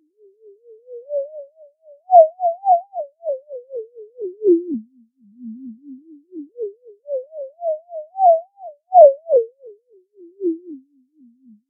track pad to pitch and amplitude

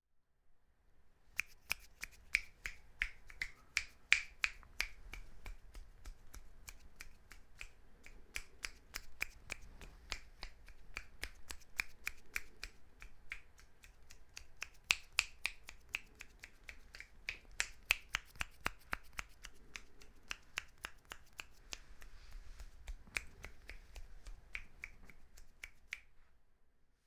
binaural finger snap

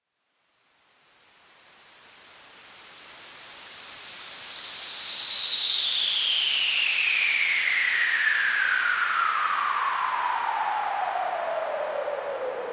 Analog Noise Slow Filter Sweep

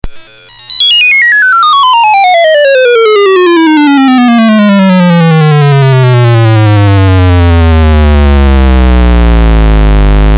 arduino sweep slow

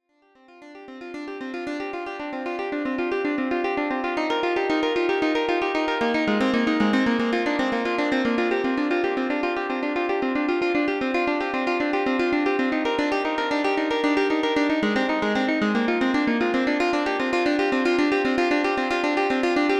Analog Space Piano

